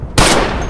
stg, Guns Fire; Weapons

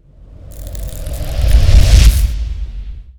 ethereal exit, Magic; civ13; civ14

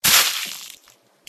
splat, Fluids

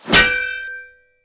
blade parry, weapons melee

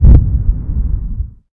explo distant far; Weapons; Explosives; Explosions

